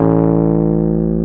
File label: WURLI A1; WURLITZ; USB Soundscan vol.09 - Keyboards Old School; AKAI